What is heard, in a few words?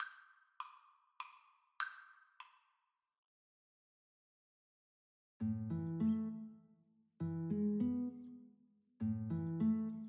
fur elise, Guitar Duet; Beethoven, Ludwig van